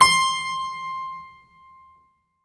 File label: HARP C6